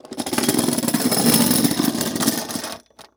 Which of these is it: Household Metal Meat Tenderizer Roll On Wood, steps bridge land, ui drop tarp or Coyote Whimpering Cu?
Household Metal Meat Tenderizer Roll On Wood